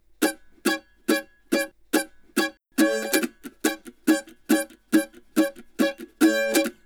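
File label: Country Mandolin Verse; Verse 1; Digidesign; Pro Tools Essential Loops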